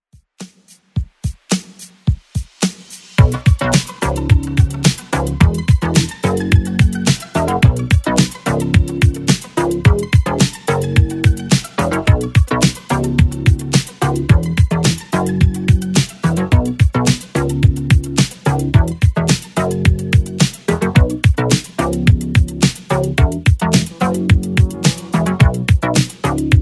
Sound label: 80s Redrum, 108bpm